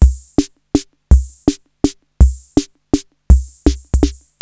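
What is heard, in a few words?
WALTZ, drum machine; Crypton - DrumBase5000; TR-66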